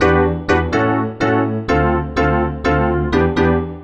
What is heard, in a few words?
E PIANO 125, Keys; Houseworx